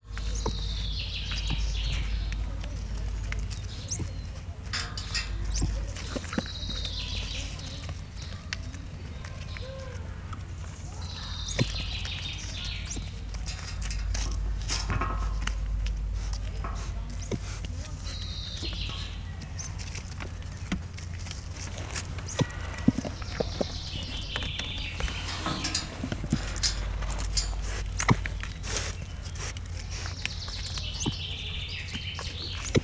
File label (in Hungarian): madár is, ormányos medve; miskolci zoo 2009